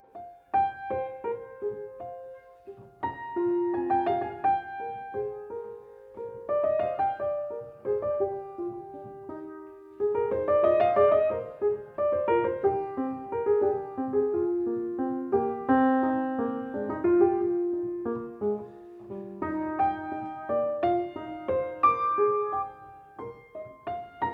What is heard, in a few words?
Piano